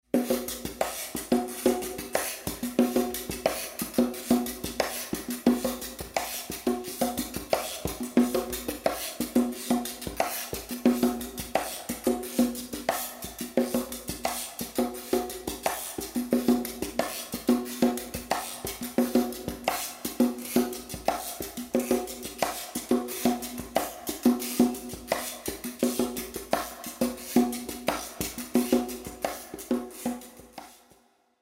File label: Percussion Room original